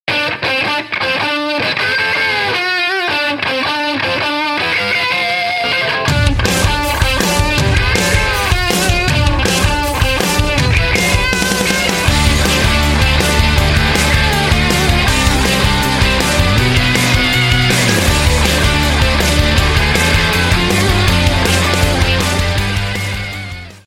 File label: rock n roll